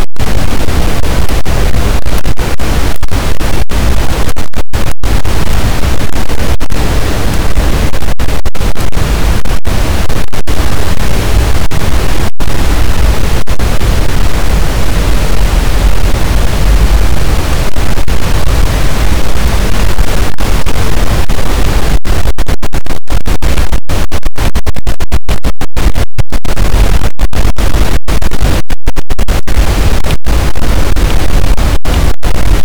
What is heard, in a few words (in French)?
cachalot positif, boat, Physeter Macrocephalus